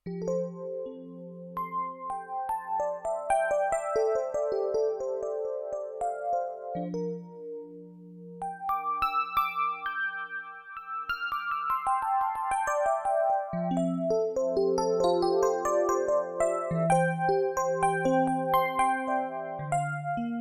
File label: Epiano